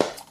Sex Smack; Lewd SFX

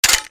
attack pre launch; Li Li Shadowpaw Sound Effects; Li Li Heroes of the Storm Responses; warcraft

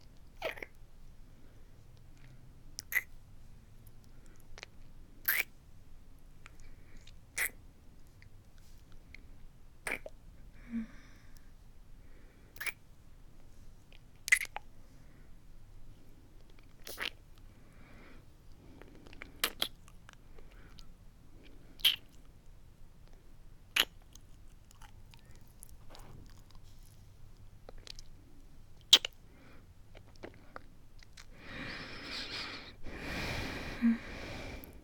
moaning, kissing, giggles, licking; F4F; Oral; messy; licking noises; sucking noises; slurping noises; Less Talking, More Eating

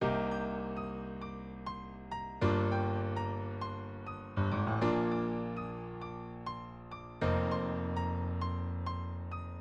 piano

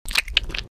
Suck; Lewd SFX

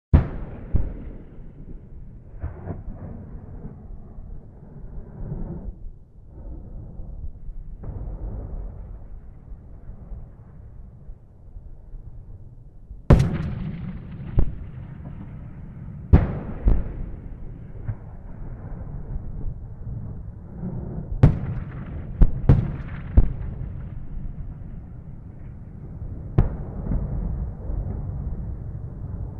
Combat Distant Explosions, War Ambience

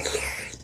Suck

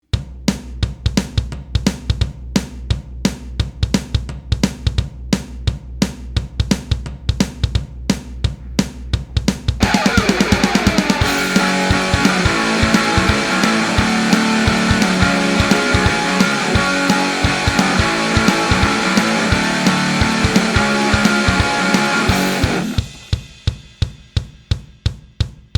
(Instrumental)

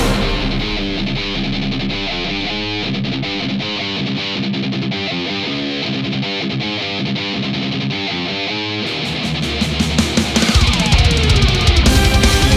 instrumental+choir

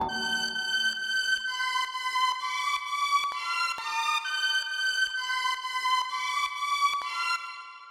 strings; Pony Fan Music Remix Stems

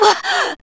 Grunt; Lewd SFX

